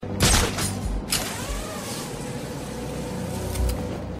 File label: Batman Grapnel